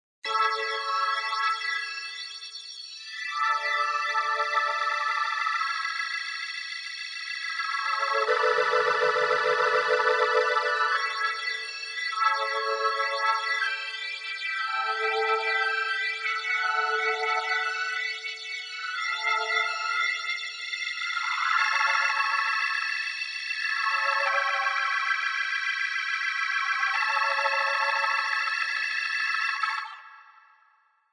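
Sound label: Arpeggio; Surround Session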